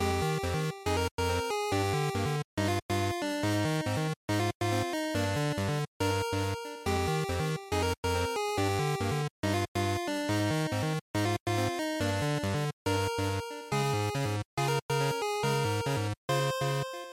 Dungeon Loop; GB RPG; bgm

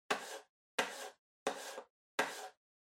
PC-Mouse-Move (Far-Wood-Hit)

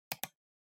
PC Mouse R

